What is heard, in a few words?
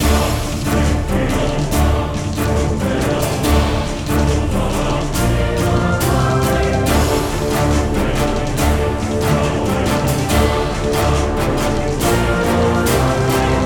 LOOP music snake; Zen Pinball Party; Hercules